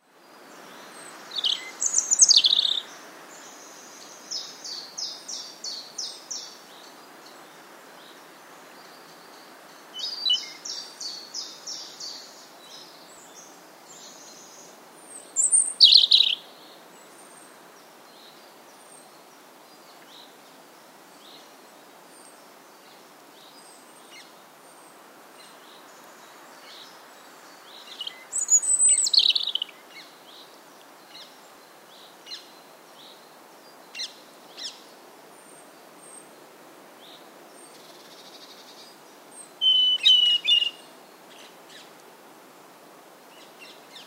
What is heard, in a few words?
Erithacus rubecula; SETE